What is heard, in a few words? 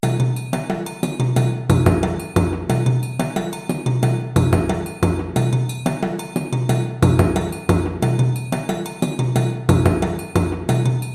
Denadon Groove 180 bpm, djembe